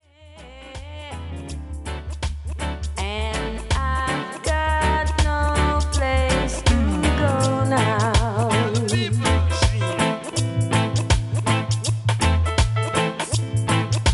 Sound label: REGGAE DANCE 90S TRACK